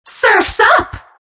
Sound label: song of the siren; Naga Siren